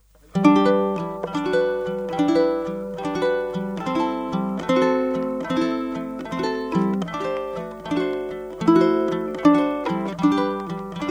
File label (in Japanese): ukurere sum koa, guitar